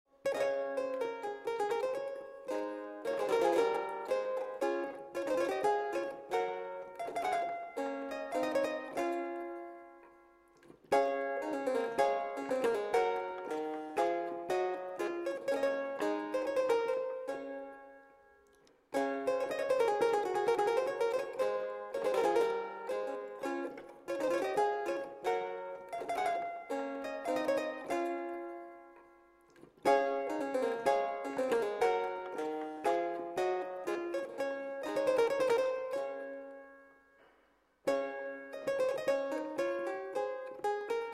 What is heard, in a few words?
Clavichord